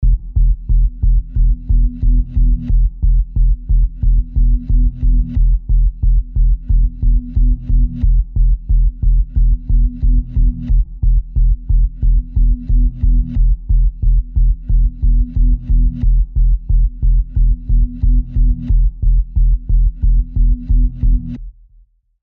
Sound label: Bass Pulse